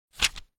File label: page flip